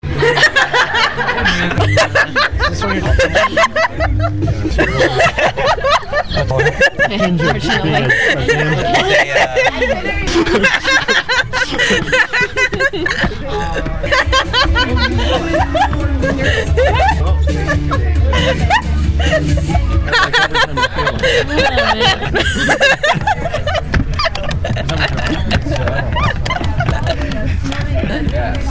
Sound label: laughs